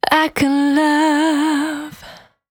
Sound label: Cry 68bpm B, Vocal; Zero-G